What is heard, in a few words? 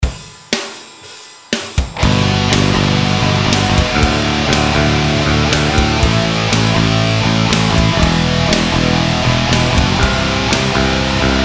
Two Strings